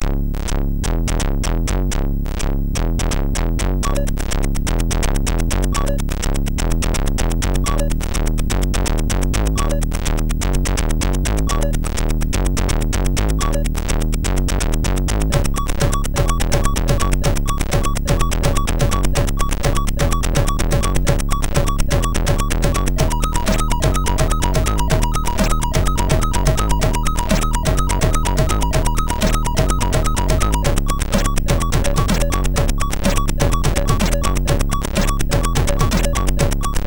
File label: Beat, MOS 6581; MOS6581R4